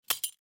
Glass Destroying; my cute commissar 2020 gamerip

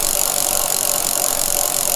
Reward Spin Loop; Doodle Jump; Android; Arcade; Xbox 360

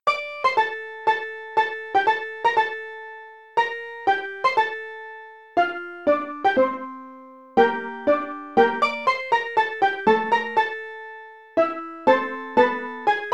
Toreador March; Super FNaF

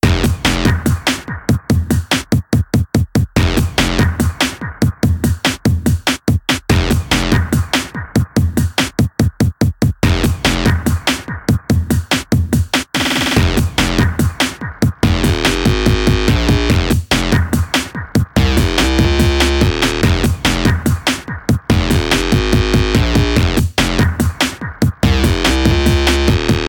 Bowling Alley Win; hot wheels beat that

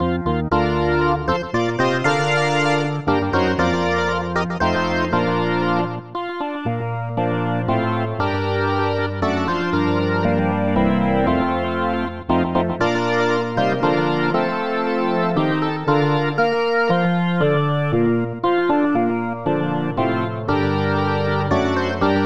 American National Anthem; Super RBI Baseball 1995 SNES

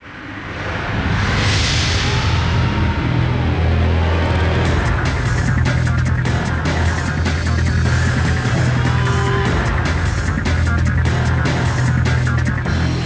Bgm Battle

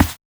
walk carpet

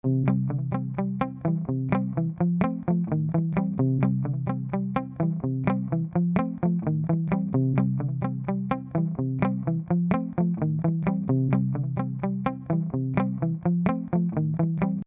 Indoor Guitar; Indoors

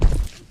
SFX Footstep; this is the zodiac speaking 2020 gamerip switch ps4 xbox one pc macos

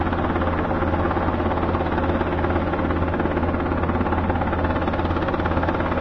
Helicopter Loop; Doodle Jump 2; Android

